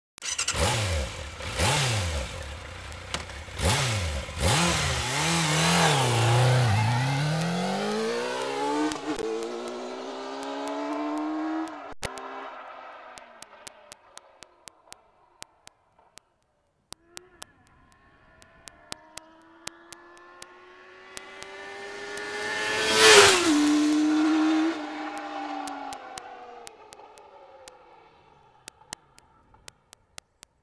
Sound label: Yamaha FZS 600 Fazer